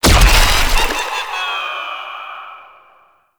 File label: Ink Wall Bounce; Shadowgrapher